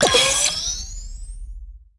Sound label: ui academy activated popup